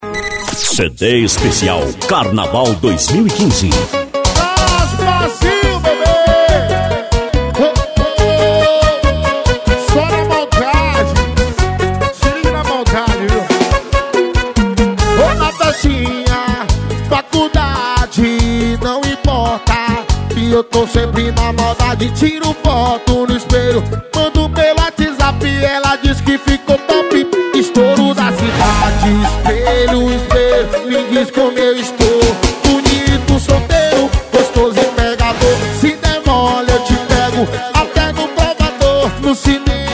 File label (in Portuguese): pagodao funk; total som; carnaval 2015